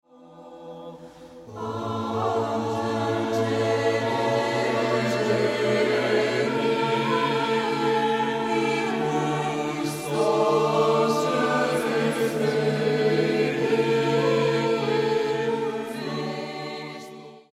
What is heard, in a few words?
oboe; la prima raccolta